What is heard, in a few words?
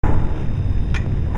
INDUSTRY